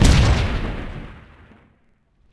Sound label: Tank Hit; Civ4 warlords